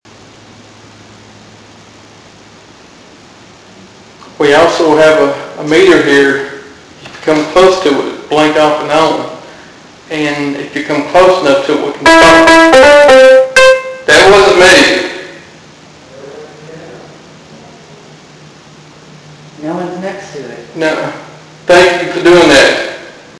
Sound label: piano playing